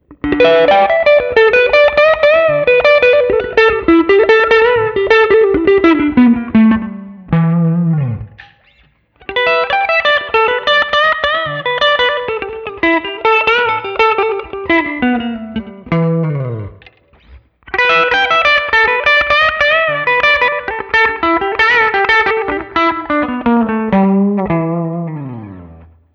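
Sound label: Guild SFIII Rockabilly 12th Riff, guitar; Guild Starfire III; 12th-Fret Riffage; 1966 Guild Starfire III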